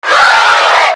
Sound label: fear die